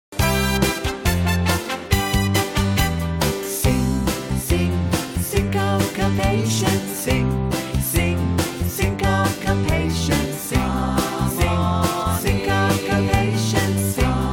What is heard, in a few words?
Syncopation